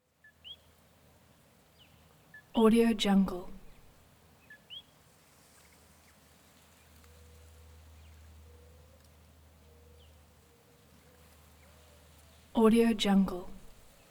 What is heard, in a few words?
jungle dawn; prosoundfx